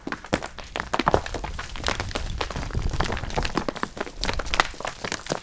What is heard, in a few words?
rocks; quake2